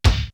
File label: TEKNO, FX